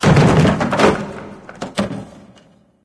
wood debris; ambient; Left 4 Dead 2; Source Engine; random amb sfx

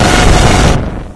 beam start; Source Engine; bshift

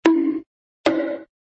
noggin klonks; cartoon sound fx; yogi bear; hannah barbera cartoon sound fx

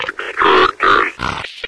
zombine ready grenade; npc; source engine; episodic

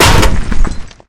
charge hit world; tf

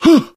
shoved, survivor voice, manager; source engine; left 4 dead 2

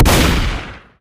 shotgun fire; Left 4 Dead; Source Engine; video games

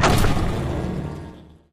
crusher separate; Source Engine; Portal 2

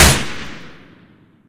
smg fire; weapons; gunfire; source engine; left4dead2

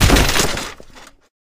taunt moped start land; video games; source engine; tf

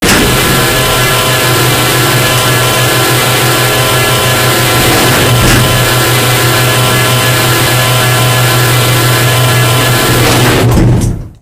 c130 door open

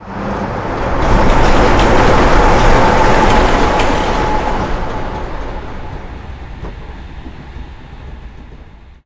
metro train passby, warehouse; Source Engine; tf; mvm warehouse

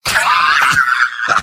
laugh short; engineer; video games; source engine; tf; mvm

